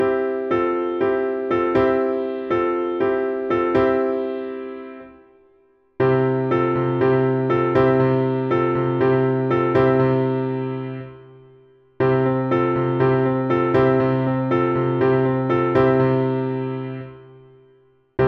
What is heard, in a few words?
Exercise in Pushes, RH Thumb Rhythm & LH Coordination